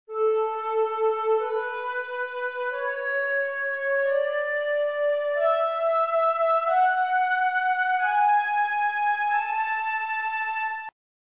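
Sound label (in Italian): la maggiore, scala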